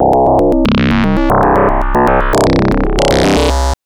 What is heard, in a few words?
Synth FM c0; TrashLibrary; FMCyces